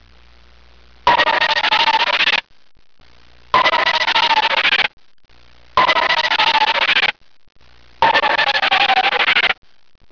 Slittamento; cartoons

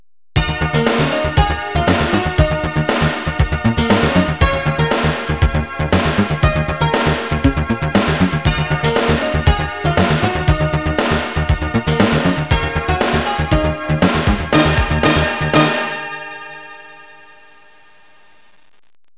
suonerie businessy

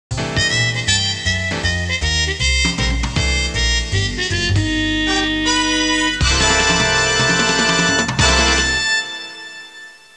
suonerie contemporanea; musica contemporary

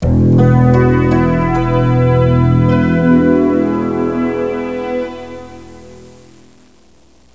musica newage

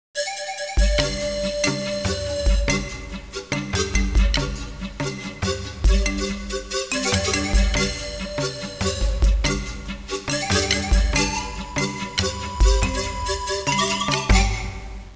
suonerie musica hawaiian

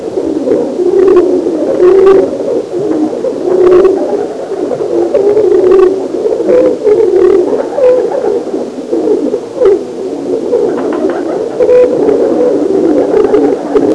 uccelli birds, animali

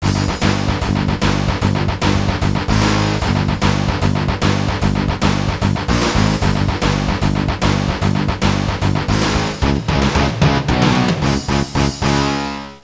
suonerie musica rock

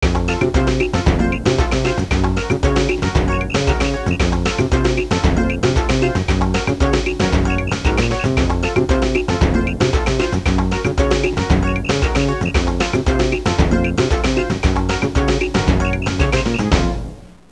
musica traditional est; fareast

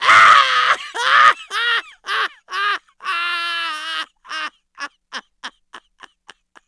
suonerie persone risate

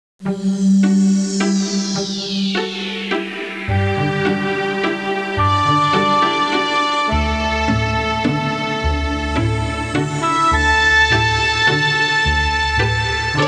musica newage

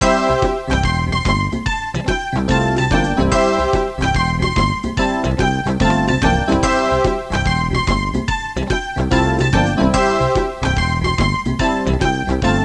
suonerie contemporanea; musica contemporary

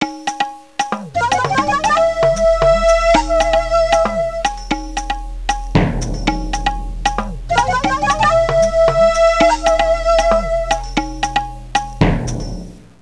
audio suonerie musica mistica

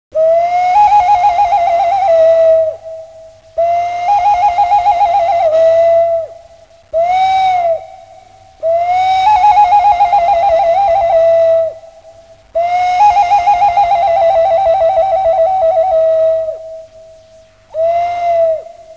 musica strumentale; instrumental

